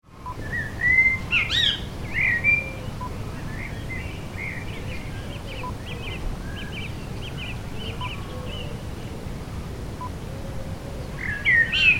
English Country Garden Summer Time

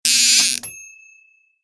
Fishing Reel Drag

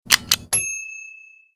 Stapler

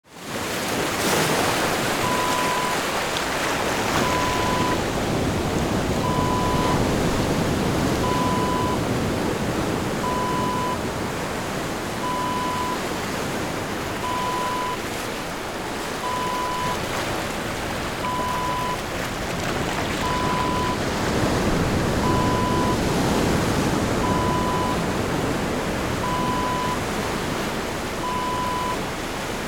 ocean waves

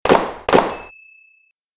double gunshot

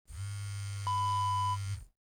cell phone vibration

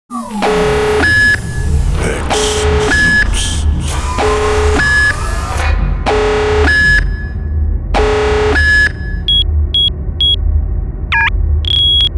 scifi; hexloops

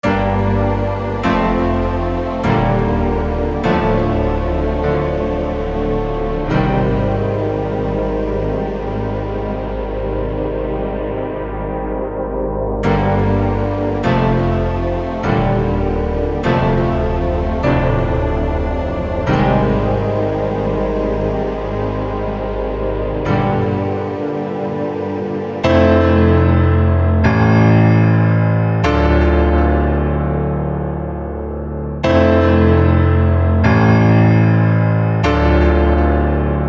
TRAP PIANO CHORDZ

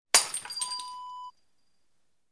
glass breaking

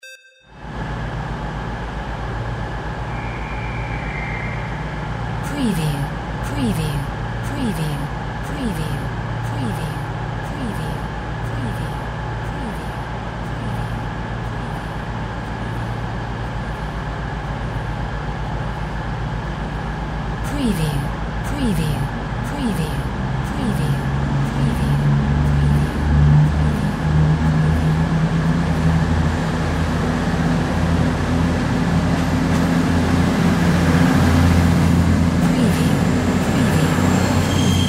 AMB TRAIN STATION ENGINE WALLAS

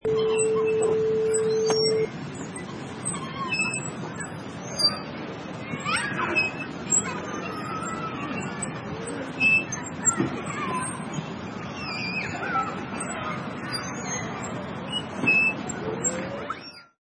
Swings at Playground

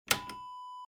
button switch

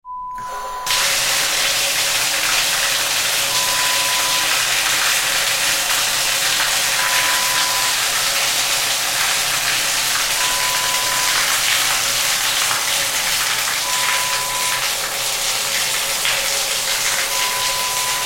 water curtain sound

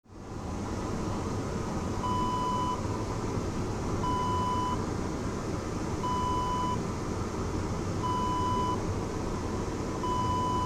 airplane toilet ambience

